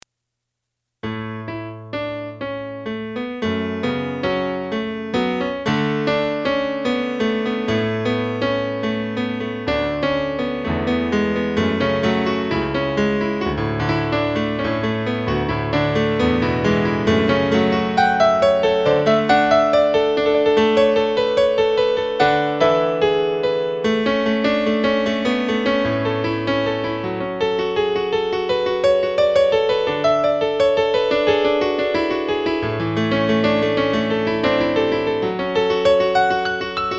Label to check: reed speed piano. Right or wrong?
Right